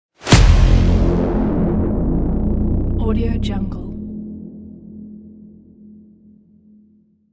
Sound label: trailer hit